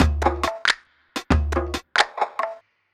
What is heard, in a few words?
PERC LOOP